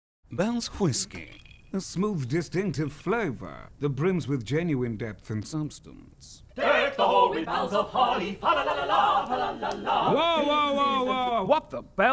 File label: Bells; Matt Berry